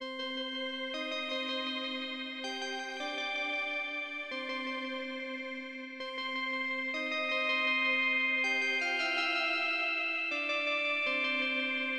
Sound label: dream synth; bombogroove